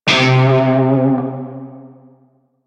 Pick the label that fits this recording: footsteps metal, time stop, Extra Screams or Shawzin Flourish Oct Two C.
Shawzin Flourish Oct Two C